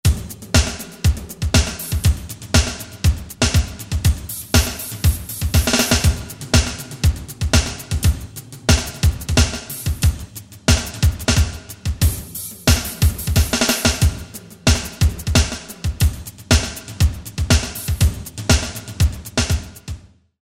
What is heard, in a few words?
Drums; TimeMorpher